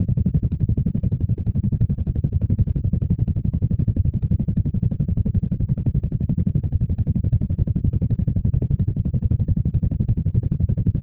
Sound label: helicopter rotors distant; Rust